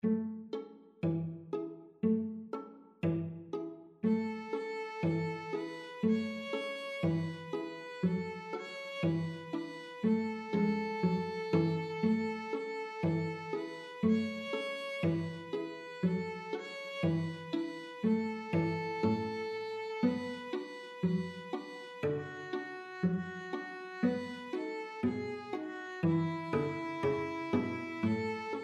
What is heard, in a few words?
string quartet